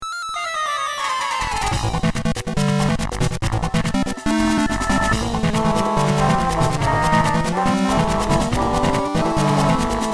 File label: Bowser Mini-Game Theme